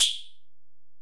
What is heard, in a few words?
EPerc, Alesis DM-PRO